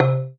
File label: glass Level Sound A; mean share and balance